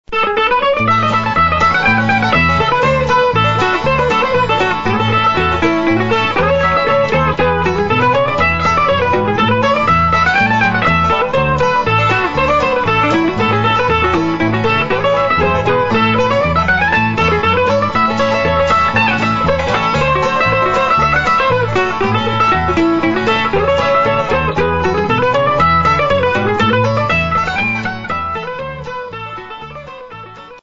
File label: mandolin